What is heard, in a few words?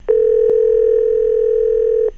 ring 2 mono; InPerson; soundscheme